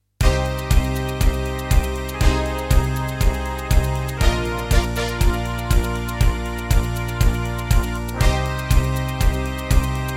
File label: Terratec MiniWaveSystem WT64; Cybernoid II